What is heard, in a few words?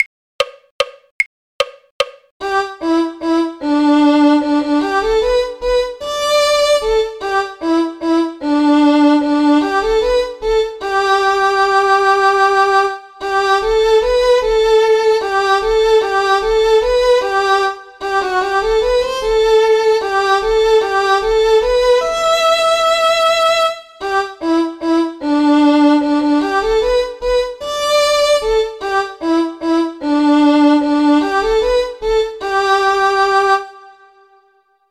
violin; carols